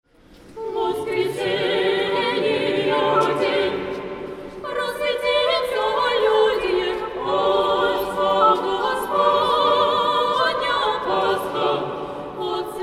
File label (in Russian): penie